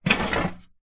office plastic file drawer tray slide open or close